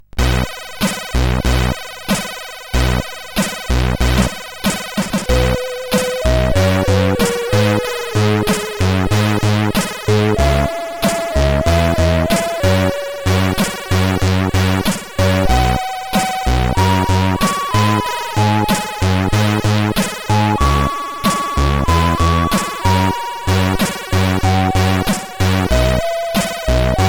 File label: S Tune, sid CSG8580R5; The Dungeon Master